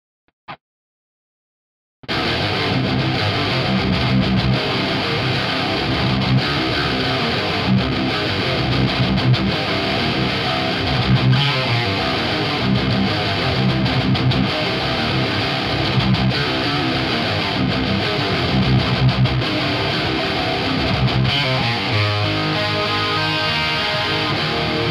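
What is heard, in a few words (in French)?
AMT R2 bass 14h mid 12h high 11h, Effet guitare; gain 12:00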